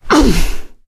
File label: melee swing